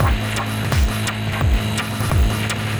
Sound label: Marching On, Synchro Funk, Beats; Sonic Foundry (Sony Creative Software)